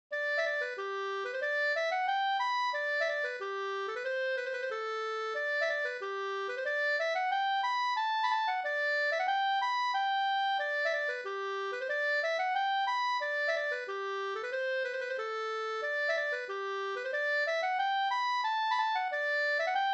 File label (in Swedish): engelska; Skepplanda